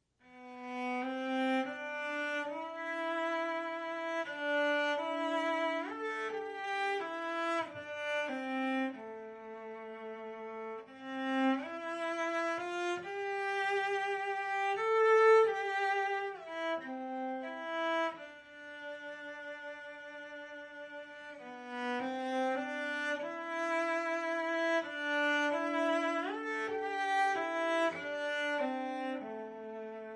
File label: cello